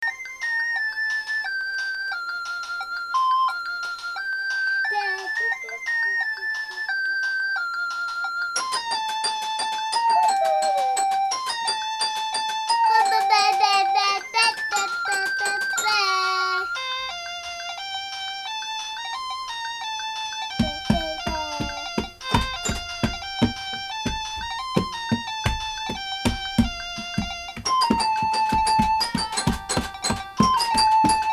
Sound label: child caciotone and toypiano